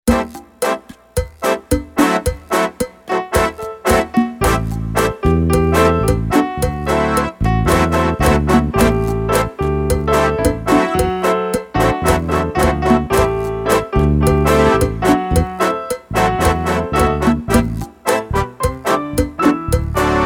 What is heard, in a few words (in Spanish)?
ORQUESTA SIN FLAUTAS